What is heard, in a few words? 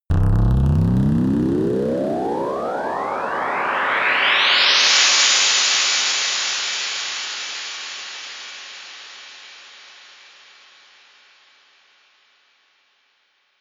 creaking noise riser